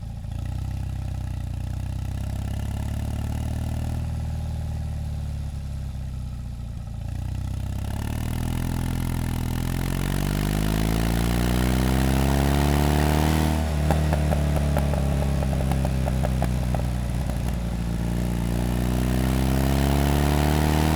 Fiat 500 Drive Exhaust hesitant long ramp ups in first gear idle shut off; anno 1971; On The Fly Audio; Rookie